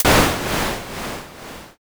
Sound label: thunder